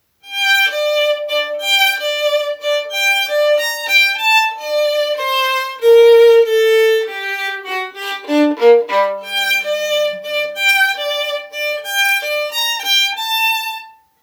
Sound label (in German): Thema Geige Concerto g-Moll; Barockes Concerto; vivaldi; musik; Barockes Concerto und Jazz: Modelle des Konzertierens